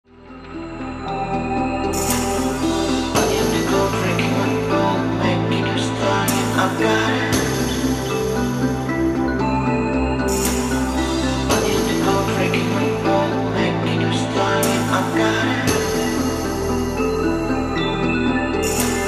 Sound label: ambient chill